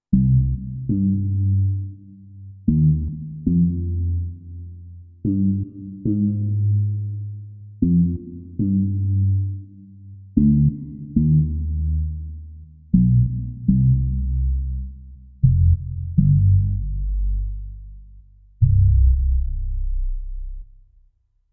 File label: Resonant Slap